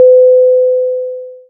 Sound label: Elevator Ping